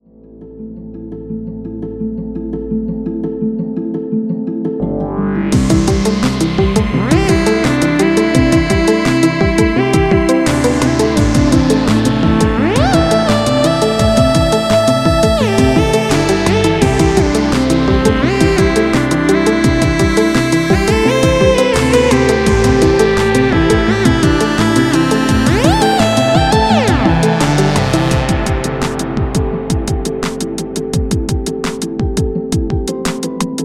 synth wind

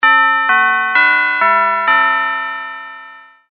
bell tone

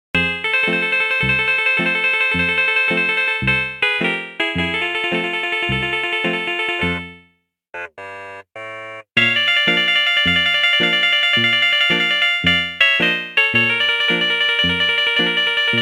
cool happy instrumental ringtone

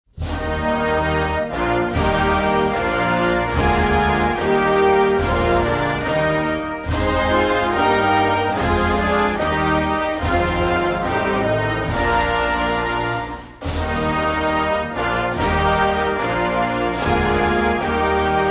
german national anthem

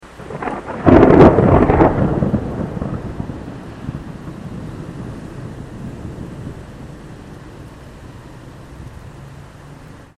thunder ringtone

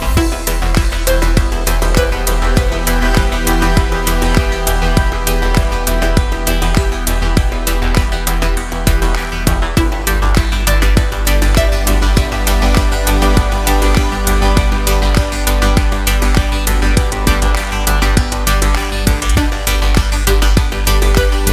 arp ascent ringtone

